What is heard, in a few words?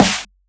Snare Woosh, 909; Slate; Vintage Drum Machines Volume 3 909 Edition